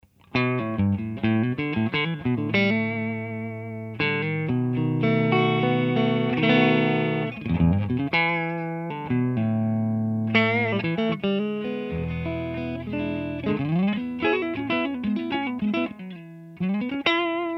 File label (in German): Tele Clean MD421 Vergleich